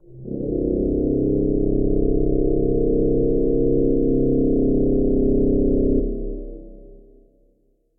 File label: Specathedral Organ, Evolving Bass; Ableton; Factory Packs; Drone Lab